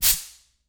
Industry Tool Air Escaping From Air Compressor Quick Burst